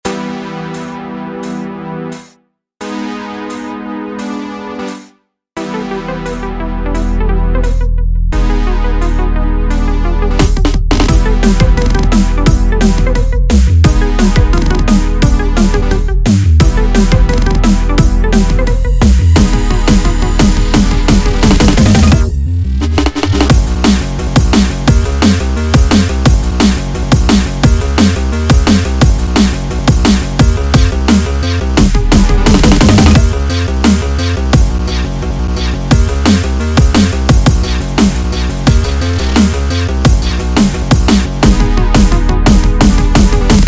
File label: Instrumental